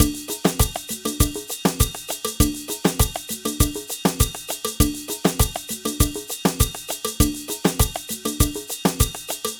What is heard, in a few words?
Ensemble, Latin Percussion